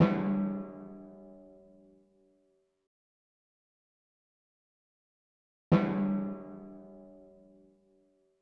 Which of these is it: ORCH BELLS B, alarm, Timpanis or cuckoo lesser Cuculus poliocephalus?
Timpanis